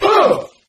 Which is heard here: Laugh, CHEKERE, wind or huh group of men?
huh group of men